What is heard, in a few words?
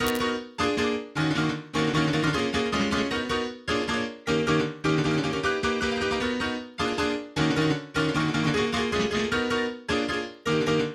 Drop Synth DRY